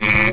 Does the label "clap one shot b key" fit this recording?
No